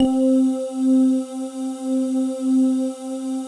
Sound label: PAD, DIGI-PAD